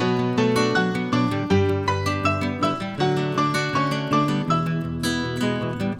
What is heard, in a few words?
Pop, ringtones; Google; NewWave Labs